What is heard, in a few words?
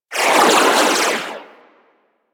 Swarm Missile Projectile By; Weapons; Warframe; Sentinel; Helstrum